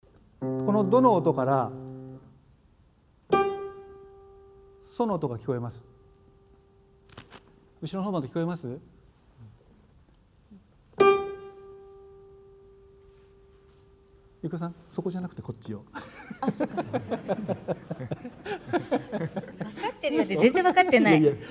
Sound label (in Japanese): 倍音実験